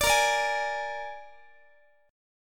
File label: A sharp 7th A sharp, Guitar; A#7; strummed; x,x,17,20,18,19